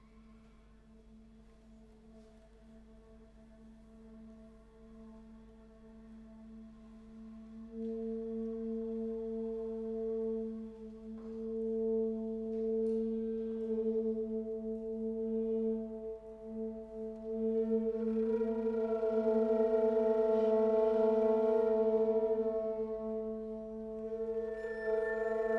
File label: street noise graffiti